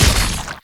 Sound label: imp dual swords